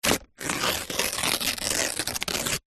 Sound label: foley paper glossy rip